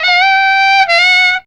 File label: FUNKY SAX; Zero-G; Funky Elements